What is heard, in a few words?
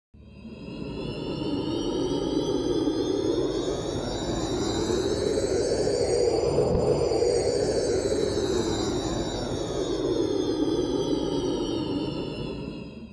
effect rise and fall